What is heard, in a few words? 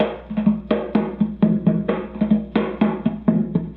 Loop, rhythmate, classic machines